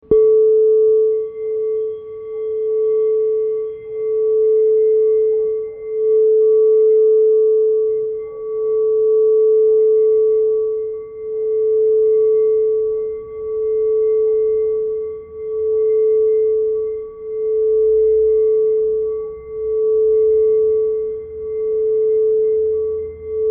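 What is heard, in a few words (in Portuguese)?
FOSCA A4, instrumentos de quartzo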